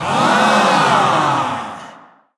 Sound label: ahhhhh; running man; genki